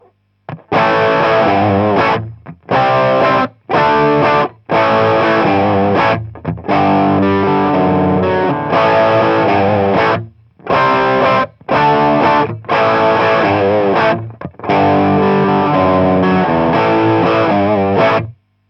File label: gtr, Shure SM57; shure sm7b